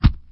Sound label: Damage